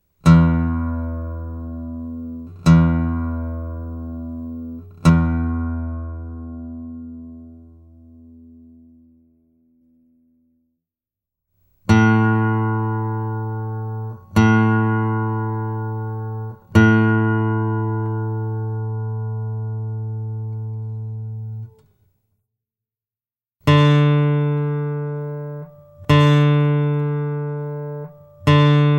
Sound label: Guitar Tuning Notes; Guitar Book 1